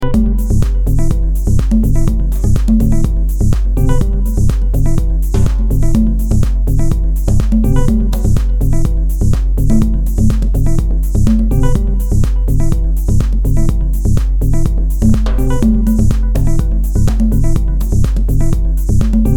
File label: basic Perc velo Rnd context; Ableton Live; percussion